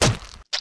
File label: soldier die; andre